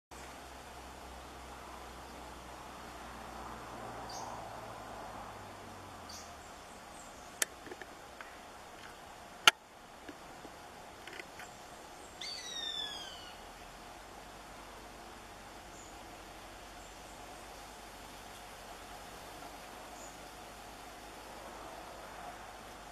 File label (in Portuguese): Canto do gaviao de cauda curta